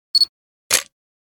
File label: Camera shutter click autofocus with flash